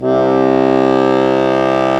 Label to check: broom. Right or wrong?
Wrong